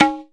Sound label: ROLAND SPD-20 PERC TABLA